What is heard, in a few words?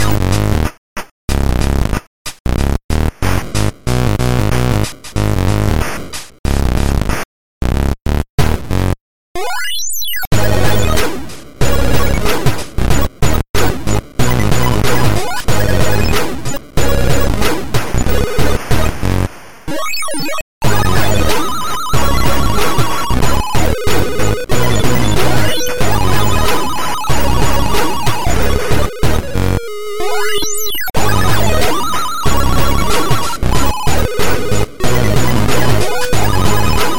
Super Portable Sound Generator (SPSG), chipmusic